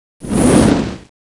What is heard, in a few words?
War Attack; Hellerwing; Mob